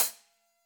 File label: marimba drum; ゆめ2っき